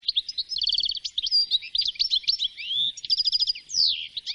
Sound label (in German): Stieglitz